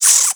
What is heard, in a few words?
Exterior Forest Critter